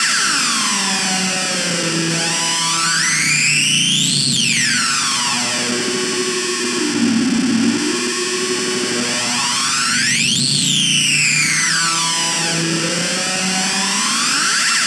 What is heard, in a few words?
SCRAPE MOOG; Distored Reality